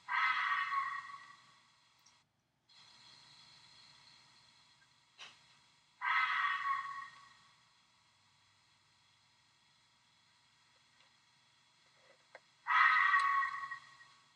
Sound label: vixen scream fox; Awful night terror noise; Stator Cover rebuild, JB Weld crack